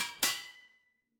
impact metal hit

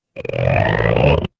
animal frog

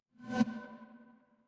transition riser

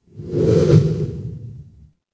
transition whoosh

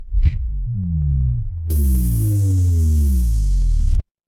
mechanical machine

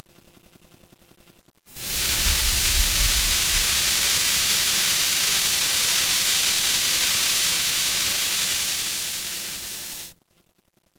ambience forest

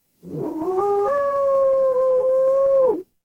animal cat meow

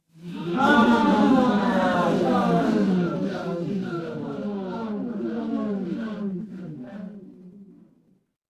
crowd gasp

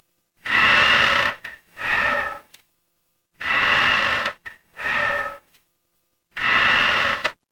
horror creak

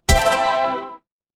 retro game hit

